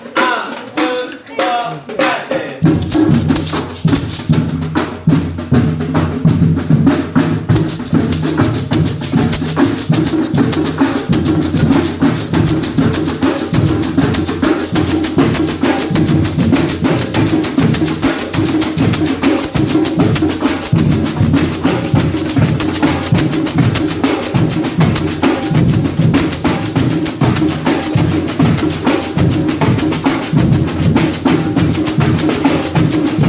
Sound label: baiao tutti